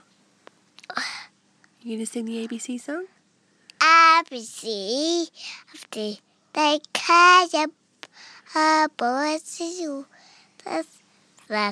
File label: kids singing their abcs; the peat kids